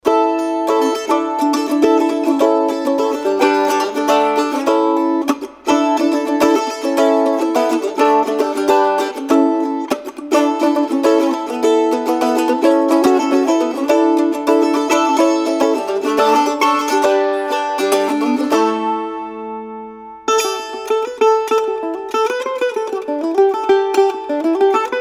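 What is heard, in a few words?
martin 2 15 burst, Guitars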